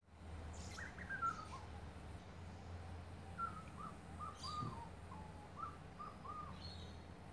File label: grey butcherbird